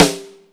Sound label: rimshot, Ragga & Dub Reggae